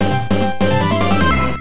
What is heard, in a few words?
Bonus Over; time cruise turbografx 16